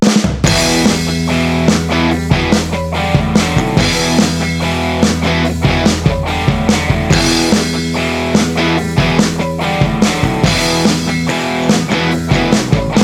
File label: Helicopter Rock; Serious Sam 4